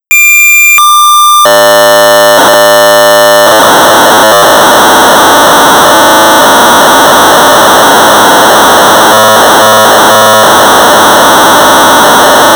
Sorcerer 1200 baud; Sub; J.T.Staley; BASIC

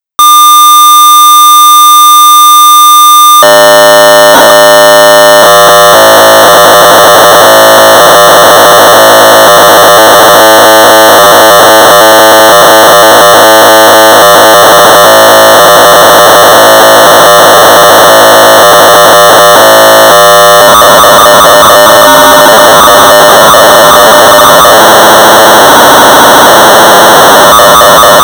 Sorcerer 1200 baud; Munch!; 1982; M.B.Hickey; System Software

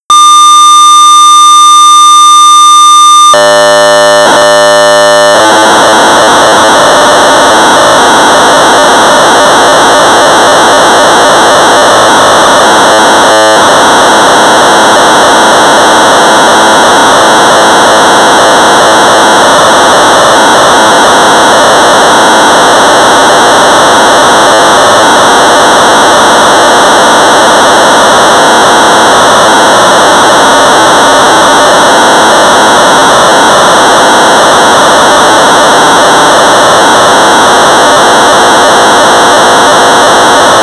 Sorcerer 1200baud; Demo3-Text editor program; P.J.Elston; BASIC

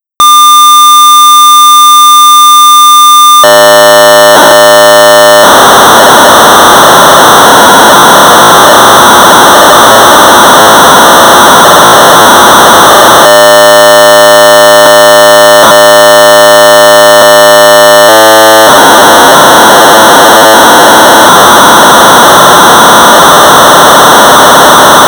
Sorcerer 1200 baud; Super Disassembler; J. Benham; Global Software Network

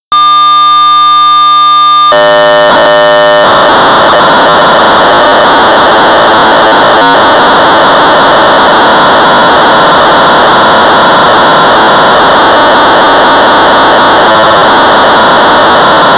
Sorcerer 1200 baud; XNIM; Wollongong-Sorcerer-Users-Group; BASIC

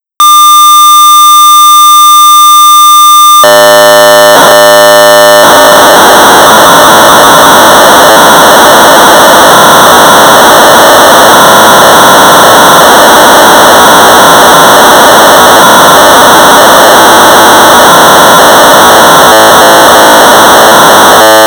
Sorcerer 1200baud; Hangman; Colin Morle; BASIC

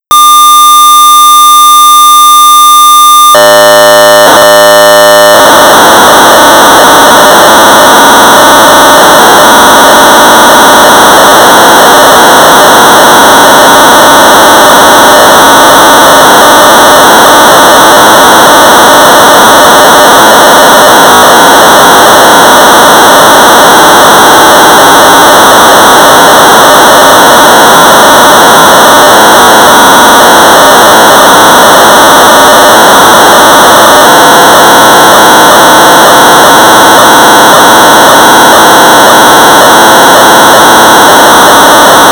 Ham Interface BASIC 1200 baud; Macrotronics S-80 Ham Interface v1.1; 1978; R.Lodewyck; Sorcerer

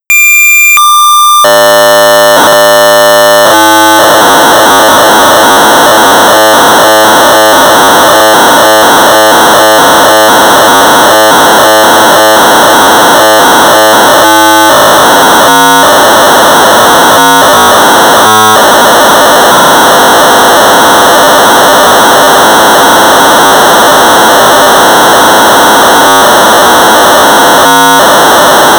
Sorcerer 1200 baud; Mastermind; M.S.Warden; BASIC

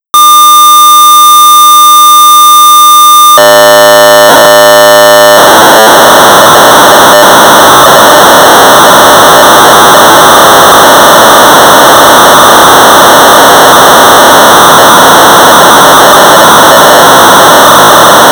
Cassette, Sorcerer 1200baud; CADAS-a Cassette-Disk database system; Jim Stafford

